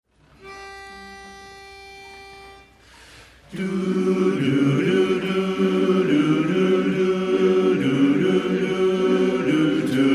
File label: bass